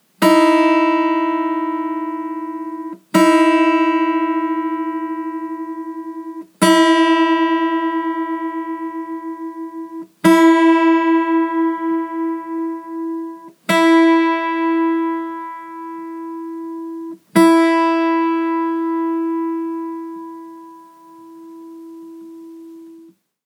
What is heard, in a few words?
unison beating